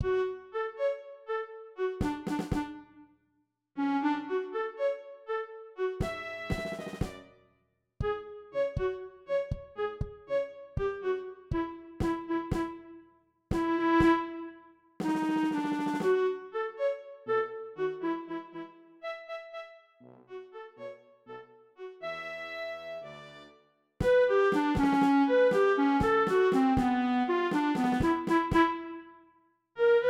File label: klar trp1